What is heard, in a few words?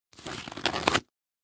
Rubber gloves latex surgical gloves removing